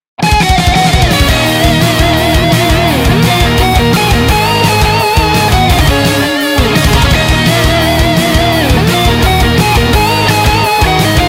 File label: tecnohard